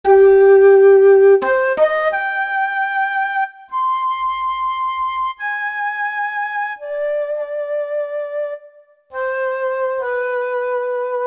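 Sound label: Alto Flute, Yamaha A4000 Wind Instruments; Brass - Wind Instruments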